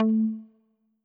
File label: pluck A